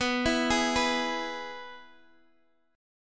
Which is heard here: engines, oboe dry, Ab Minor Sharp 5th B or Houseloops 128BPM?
Ab Minor Sharp 5th B